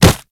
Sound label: Kubrow Run Snow, Footsteps; Enemies; Warframe